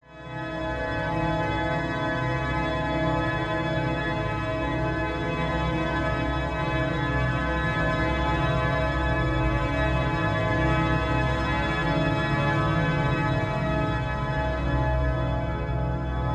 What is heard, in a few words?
sleep onset organ